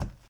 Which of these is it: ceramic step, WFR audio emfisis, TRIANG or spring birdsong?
ceramic step